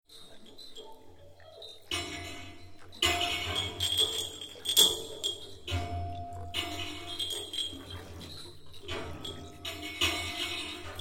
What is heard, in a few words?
Middle Island Passage Bell Buoy